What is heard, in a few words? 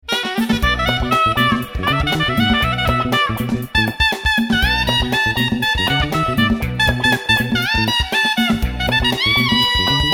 Funk Cuv Sop Gotsu Sepia